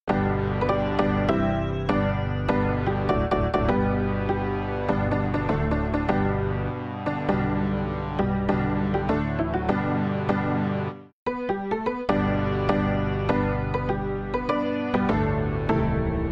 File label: March of the Volunteers instrumental